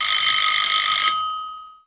phone

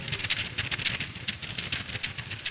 paper; CHASM

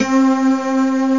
atmosphr; gmplay

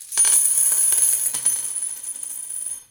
coin; Euro Truck

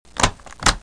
lock; Listings Deluxe - Select Street Atlas 1.0

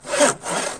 Saw; Hamsterball

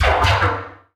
DRUM SHOT HOUSE 125; eJay; Singleshots

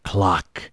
clock

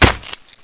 stab

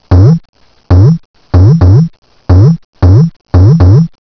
DUMDUM, Sound Effect; Talking E-Mail

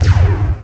SHOT

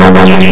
STARTUP

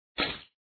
drop

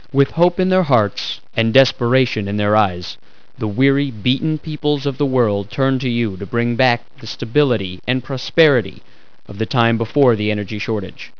lose

